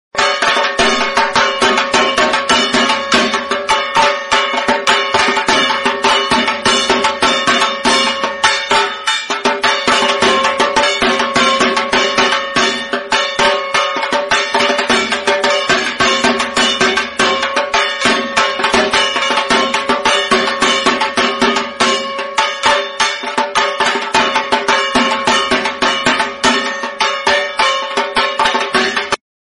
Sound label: durga puja dhak sound bangla